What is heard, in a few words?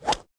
Ball Player Hand Hit Golfball Hard